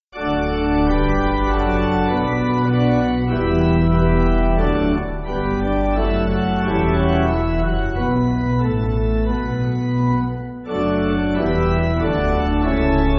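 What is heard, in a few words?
Pipe Organ, Music Backing; Honour Your Father; 孝親歌; Filial Piety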